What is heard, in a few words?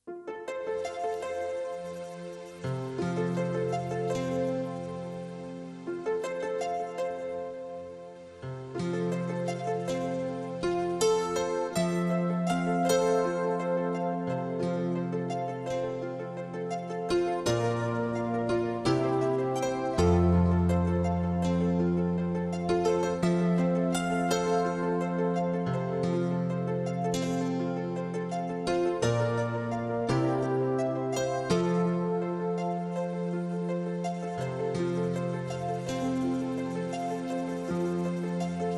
Sping Rain; 电脑报 bgmusic